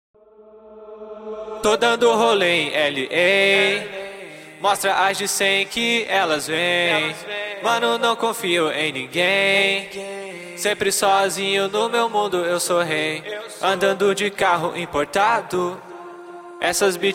Brazilian Trap trap 160 Cm, Acapellas

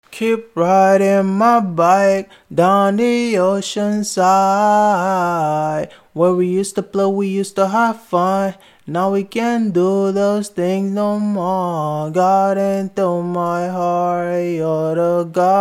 chill out 150 Gm, Acapellas

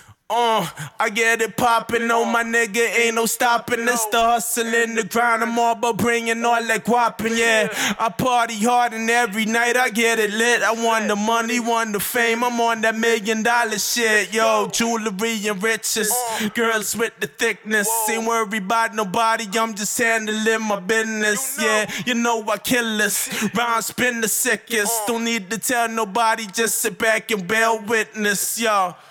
137 Bm

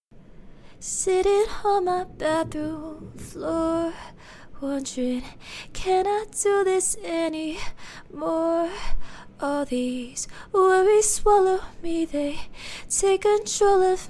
rnb 90 A; acapella; I'm Sorry

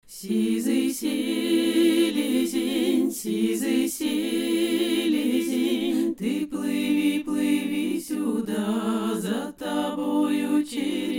Chorus folk 80 Am, Acapellas

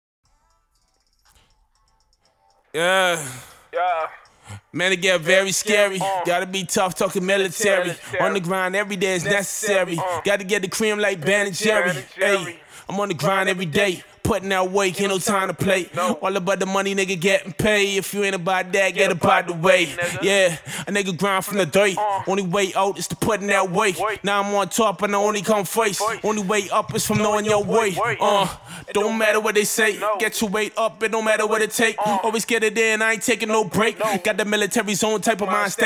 hip hop 120 Gm

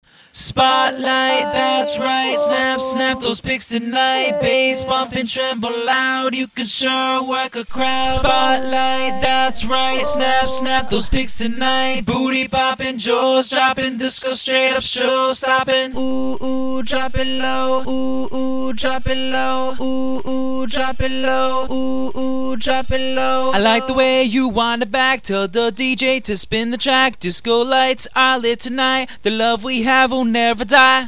pop, 122, C, acapella

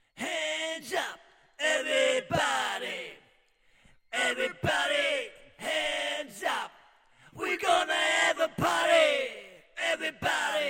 heavy metal, 120, C, Acapellas

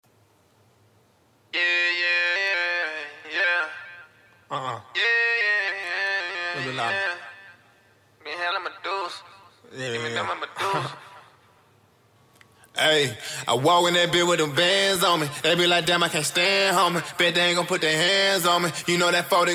rap 146 F, Acapellas

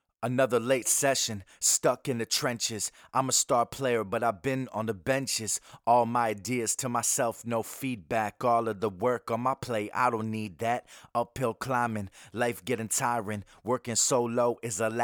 Soundtrack HH 94 Am

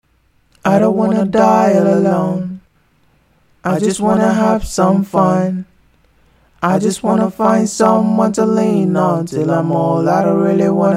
chill out 140 Em; acapella

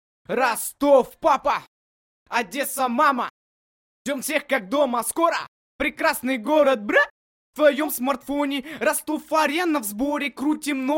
RUSSIAN ANGRY VOCAL rap 138 D; acapella

